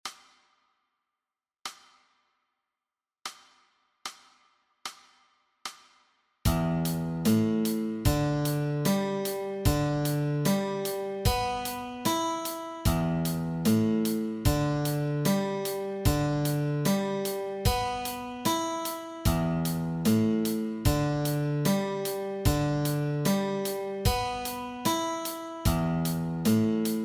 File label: Guitar Exercise